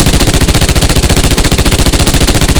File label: Automatic Rheinmetall Mk20, Weapons; DCS